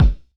Pound Low Tuned Flap, Kicks; Airwindows

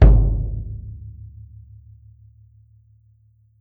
drum, mixed drums, World Instruments

